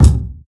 bumpin kickdrum sound d sharp key, kick drum sound tuned to the D# note; kick drum samples